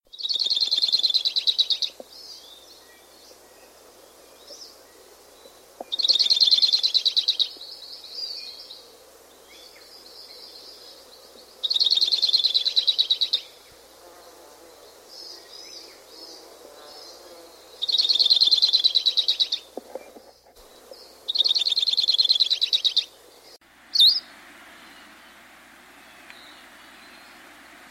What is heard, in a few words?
Hawaii Creeper